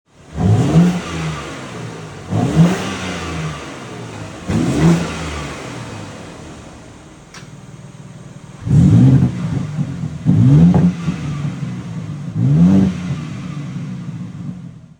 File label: revs, bmw m4 g82; ultimate package dravit grey